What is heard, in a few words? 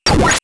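battle intense hit; SNES; SMRPG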